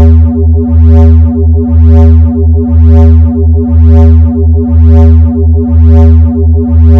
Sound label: bass wahwah